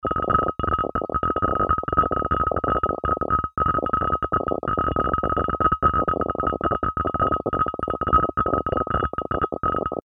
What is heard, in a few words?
grain